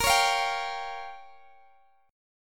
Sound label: Bb Minor 6th, Guitar, DADGAD; strummed; x,x,20,18,20,17